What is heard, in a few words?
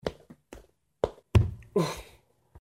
footsteps Hit Wall; Bad Water